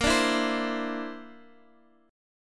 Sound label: D 7th Suspended 2nd Sharp 5th Bb